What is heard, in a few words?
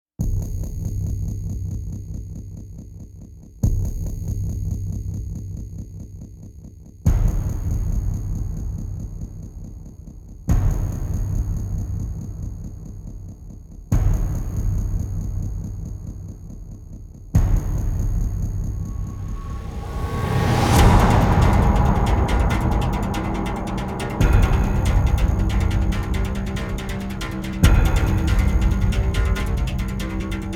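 percussion only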